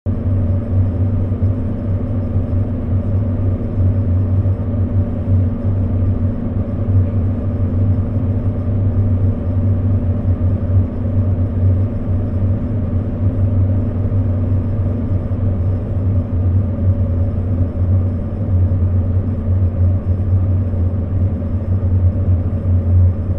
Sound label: Heater